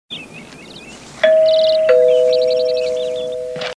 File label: rings, doorbell with birds in the background